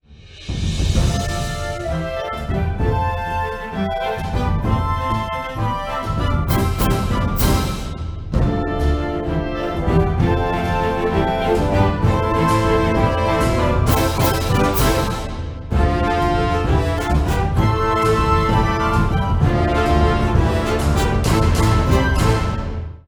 future synth; AlienWay

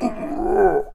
zombie; CityHero

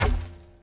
shot in player; Alpha-E-Corp

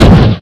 crash; Formula Racing

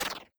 enemy hit; Season Land

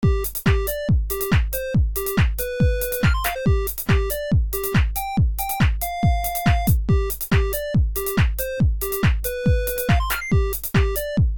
music; flapcat; FlapcatSteampunk

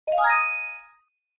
add seconds; FishingFrenzy

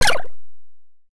boss shoot; Season Land

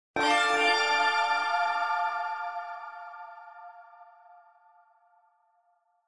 jingle lose; Moto Beach Ride

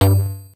shoot win; Space Shoot

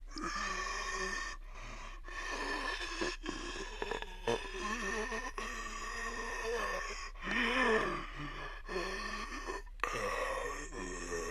zombie; ZombiesNight

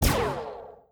gun; EarthAttack

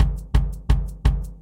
helicopter normal; Flapcat Copters

